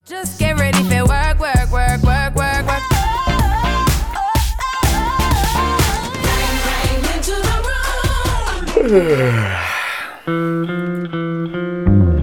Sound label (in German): Jingle selber machen